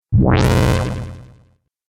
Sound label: Dubstep Audio Laser; Laser Sound Sound Effects